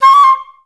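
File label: flutes C5; Best Service; Peter Siedlaczek's Advanced Orchestra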